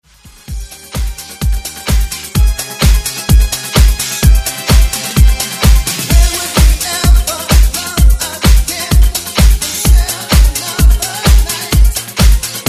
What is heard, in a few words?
80s Dance Redrum